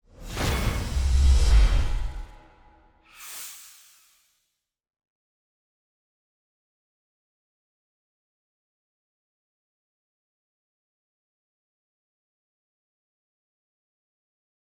SFX; Unsung Hollywood; Cooley High

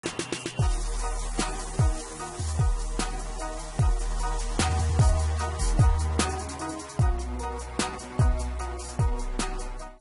Music; Jeep Empire Outro

